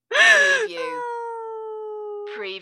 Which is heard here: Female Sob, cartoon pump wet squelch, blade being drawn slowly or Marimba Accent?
Female Sob